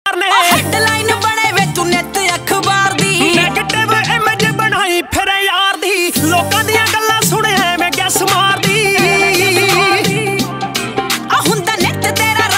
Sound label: Punjabi Ringtone